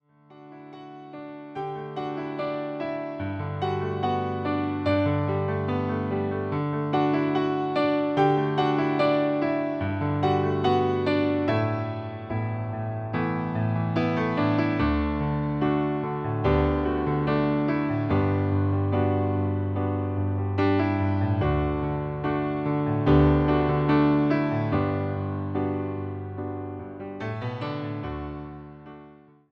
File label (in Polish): instrumental bez linii; skrot; wersja instrumentalna